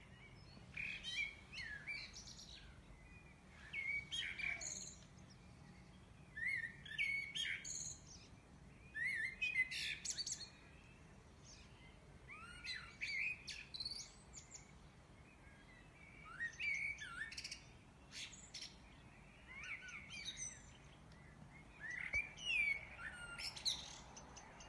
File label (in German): Amselgesang